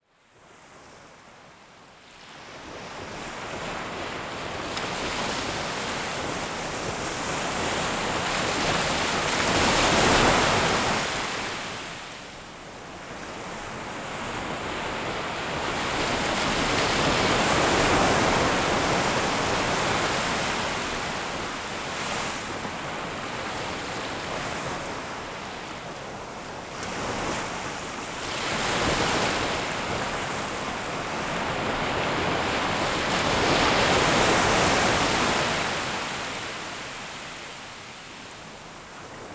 Meeresrauschen; Naturger usche Meeresrauschen

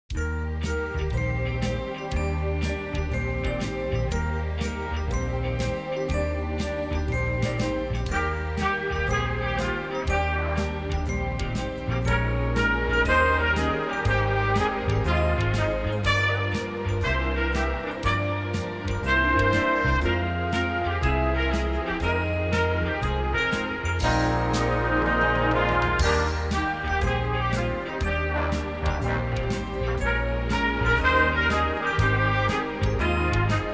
Orchester

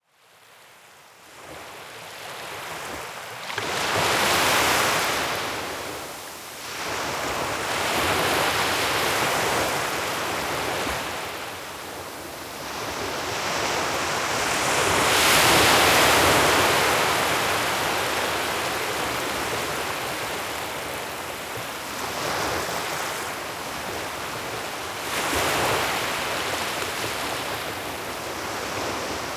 Meeresrauschen

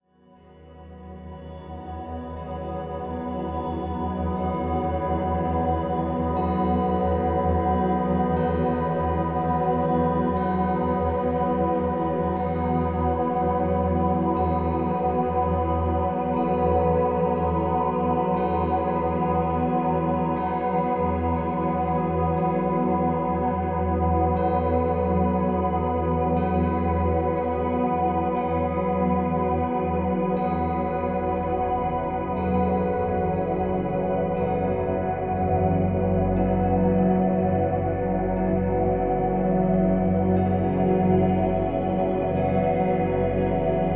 Glocken

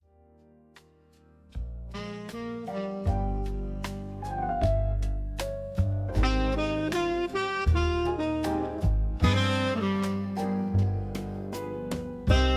Instrumental Jazz

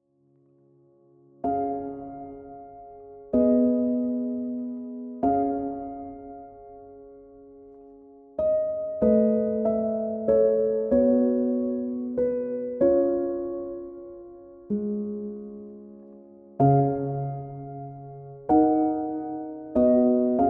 Himmlisches Klavier

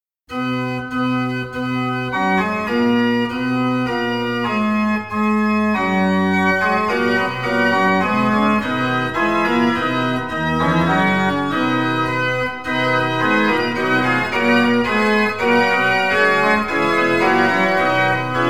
Ein Feste Burg Ist Unser Gott Volkland-Orgel; Elxleben

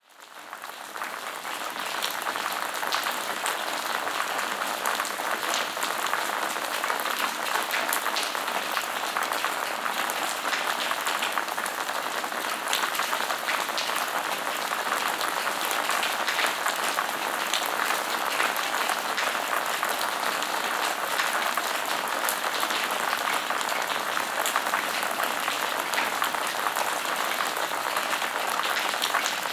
Regen zum Einschlafen; Naturger usche Schlafmusik

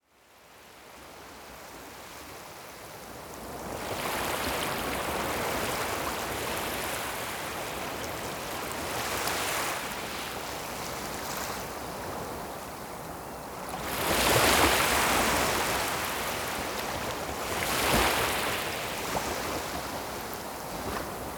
Meeresrauschen pur, Naturger usche Meeresrauschen

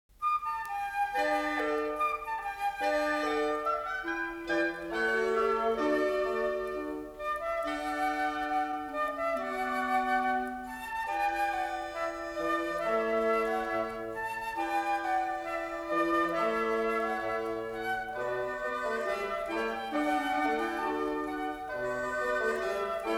Weihnachtsmusik

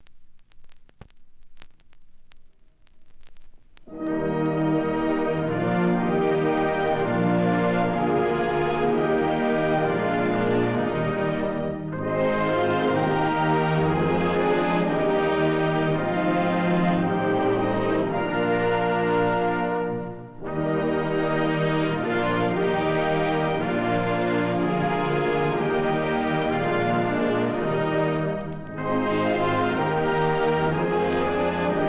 Musikkorps des Wachbataillons Deutschlandlied; Friedrich Deisenroth

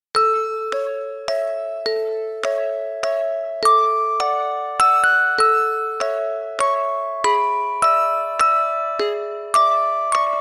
Kinderlieder Schlafmusik

